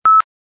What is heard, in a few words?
pips